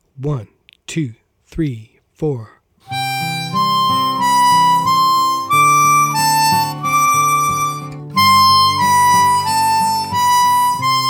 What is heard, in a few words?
Fast; Aura Lee